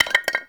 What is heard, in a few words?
Clay Pot Hit Drop; Warframe; Awakening Remaster